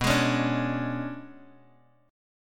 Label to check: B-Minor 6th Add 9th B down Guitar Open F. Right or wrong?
Right